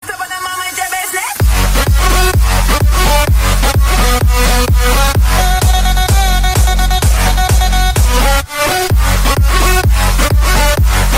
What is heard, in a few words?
electro music mix ringtone